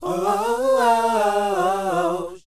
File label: SHOO B C, R&B Dance, vocal; Spectrasonics Vocal Planet